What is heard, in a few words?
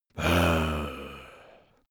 zombie moan idle